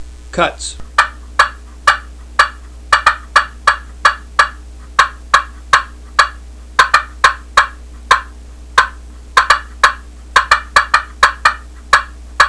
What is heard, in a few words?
cutts, turkey calls friction; supersound 2000; canecreek